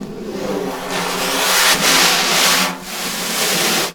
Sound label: Snare Drum; Percussion Samples